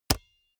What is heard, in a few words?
basketball single hand catch hard